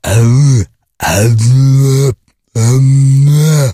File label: zombie idle; STALKER; customnpcs